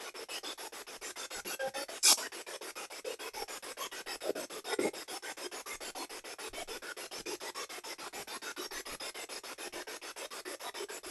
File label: Spirit Box; Demon Room